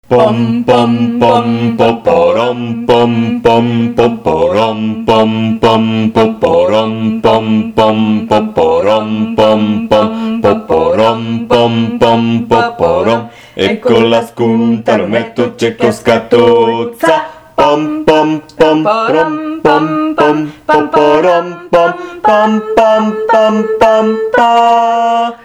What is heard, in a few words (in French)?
tango basse